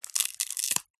dry dead bark section twist crush snap; zapsplat

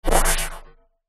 science fiction electricity spark surge; zapsplat

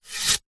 wood long branch pole slide hand; foley

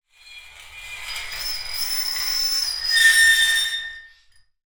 foley metal scrape shrill

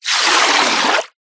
nature water movement swish; zapsplat

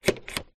musical midi keyboard controller fader movement; zapsplat

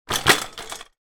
transport bicycle impact crash hit fall over

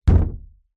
impact wood thump hit hollow

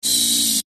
science fiction android servo robot movement; zapsplat

